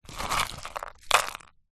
dried chilies take out of plastic pot and drop back in; zapsplat